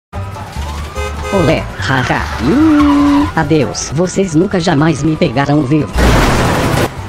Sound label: ole haha